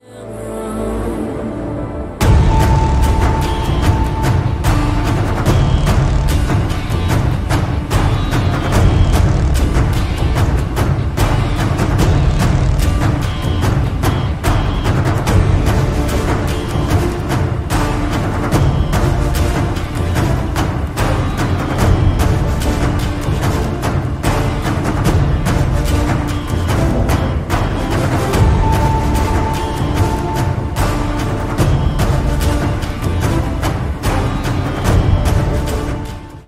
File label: Theme Music